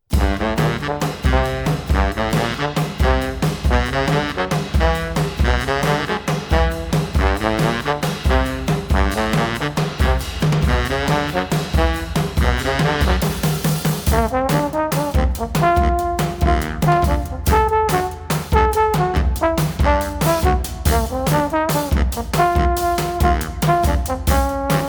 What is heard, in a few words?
brass band